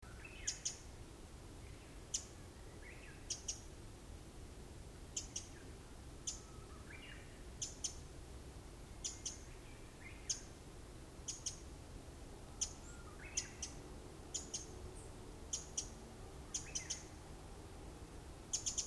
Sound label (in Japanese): misosazai